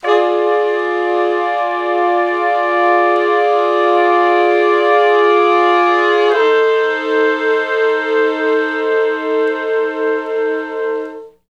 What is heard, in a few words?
Slo Blues Chords [IV, IV, I ,I] (F), Horncraft for R&B; Sonic Foundry (Sony Creative Software); Sections